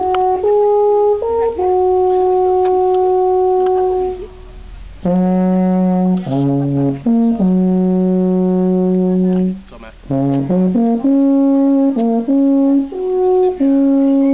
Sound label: alphorn